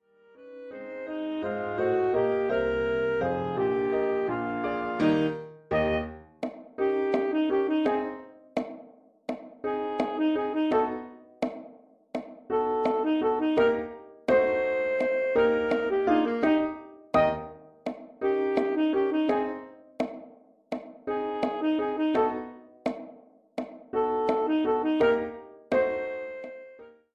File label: SAX SOLO STOP TIME SECTION; The Ragtime Dance